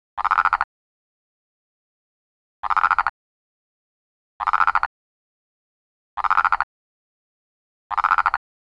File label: Phyllomedusa sauvagii; amphibia